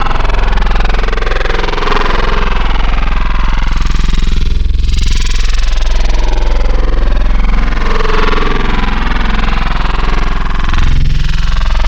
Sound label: Multiple Formants, Growl Wavetables; Cymatics; Virus Wavetables; Serum